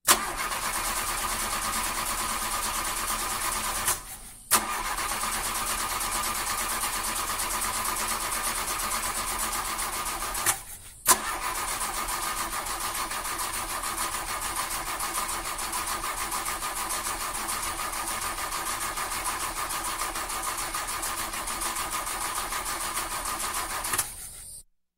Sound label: Car Engine Not Starting Noise